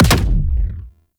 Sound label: Exalted Sniper Alt Fire Punch; Frumentarius